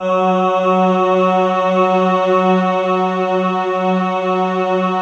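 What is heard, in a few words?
slow orchestra pad, Slow Pad 5th; Optical Media International; Sonic Images Library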